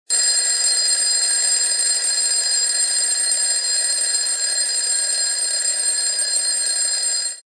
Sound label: school bell